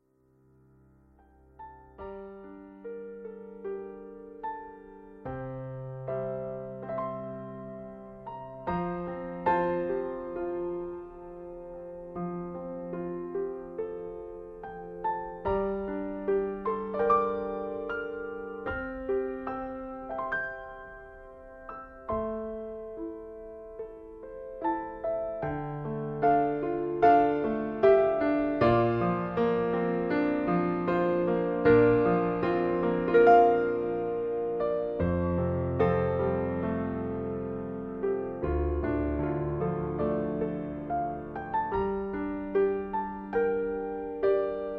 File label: piano